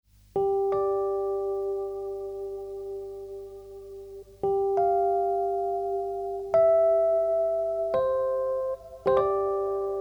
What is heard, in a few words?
Dark Room Rhodes MK1